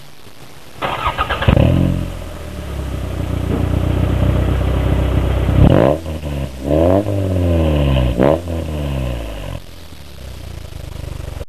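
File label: pro racer 3SFE, muffler, TOYOTA CURREN; 5ZIGEN Pro Racer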